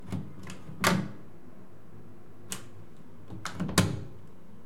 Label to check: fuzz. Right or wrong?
Wrong